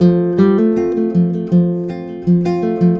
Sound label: SPANISH F, GUIT, 80BPM; Best Service; World Colours